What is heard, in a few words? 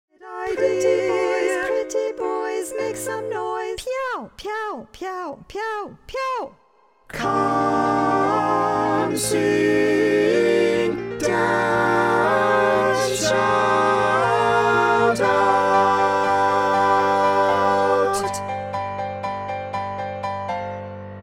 Long Notes All Voices; Auditions